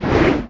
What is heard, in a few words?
Axe; ChooChoo Heroes